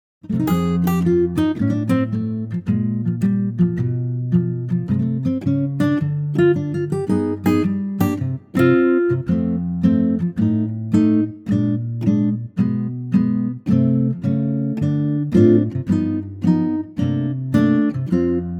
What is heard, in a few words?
acoustic guitar F; key - F - vocal range - C to C; Superb acoustic guitar arrangement